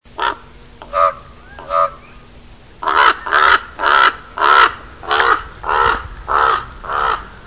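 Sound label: voron golos, raven voice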